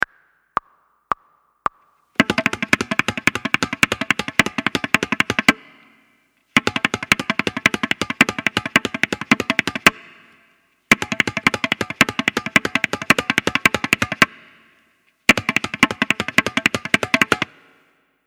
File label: Long Roll Practice